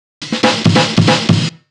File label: Fills, Loops 140 BPM